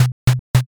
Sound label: door bang